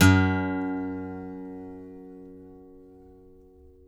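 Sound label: acoustic guitar pick F#2; Country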